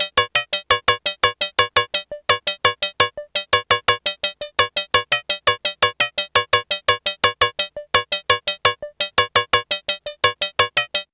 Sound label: MINIMOOG; AMG - Vince Clarke Lucky Bastard